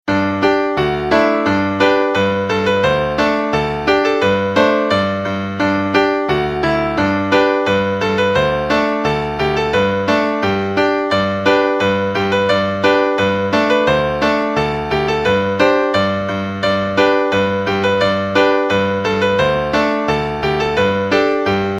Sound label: polka